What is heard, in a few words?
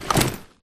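grunt movement foley loud medium; Titanfall 2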